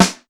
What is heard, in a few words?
VACATION SD, RY30 KIT; Crypton - DrumBase5000